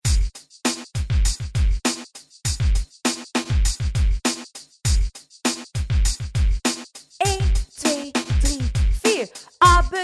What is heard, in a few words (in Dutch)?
Letterrap instrumentaal